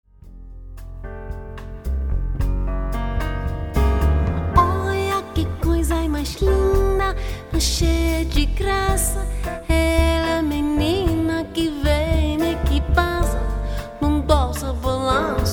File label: sung in Brazilian Portuguese; variety band